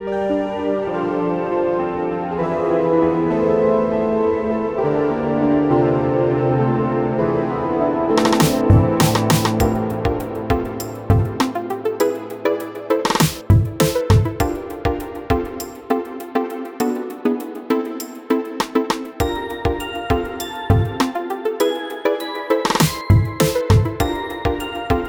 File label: Bells